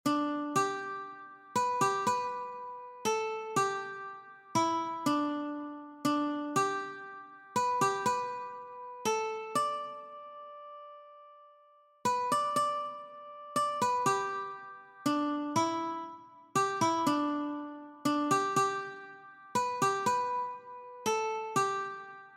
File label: Amazing Grace Ukulele